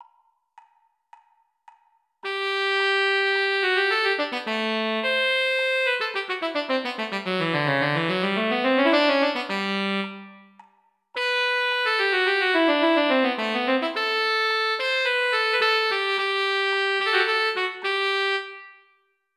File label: Tenor Sax